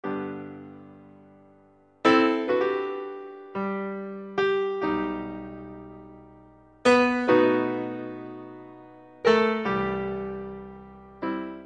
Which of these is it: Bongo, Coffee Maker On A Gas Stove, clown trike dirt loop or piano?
piano